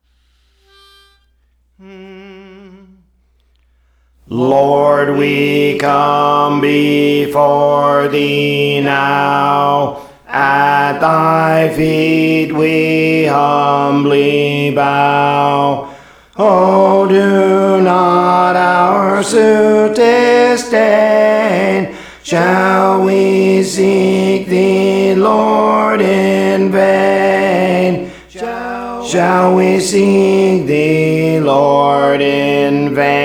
Alto, Songs; Praise For The Lord Training; Lord We Come Before Thee Now

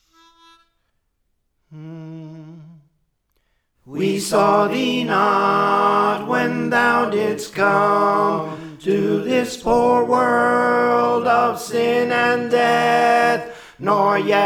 Tenor; Praise For The Lord Training

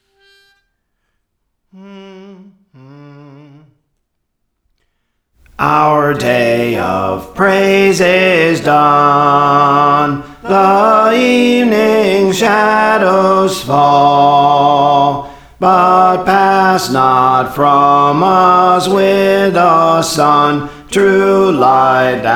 Bass, Songs; Our Day of Praise Is Done; Praise For The Lord Training

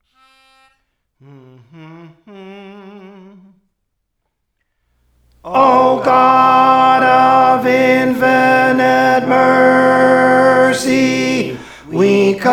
Tenor, Songs; Praise For The Lord Training; O God of Infinite Mercy

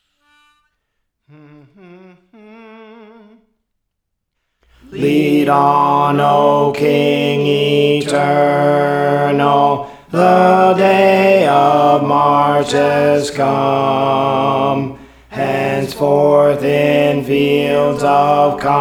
Alto; Praise For The Lord Training; Lead On O King Eternal

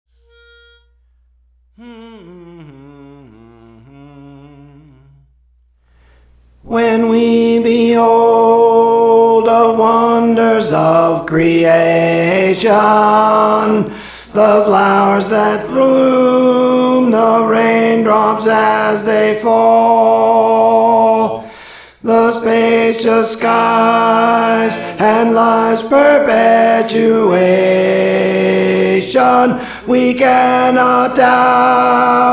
Tenor, Songs; Praise For The Lord Training; Lord I Believe

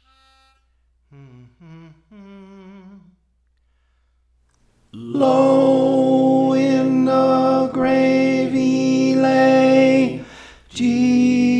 Tenor; Praise For The Lord Training; Low in the Grave He Lay

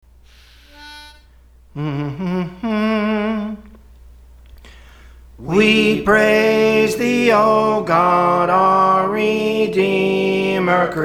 Soprano; Praise For The Lord Training

